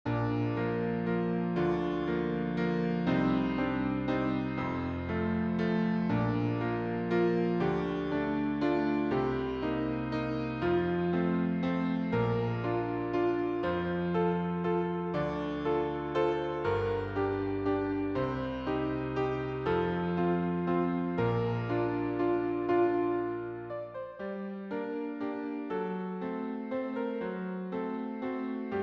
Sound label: waltz; waltzes